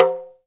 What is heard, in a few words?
TALK DRM, Heart of Africa; Spectrasonics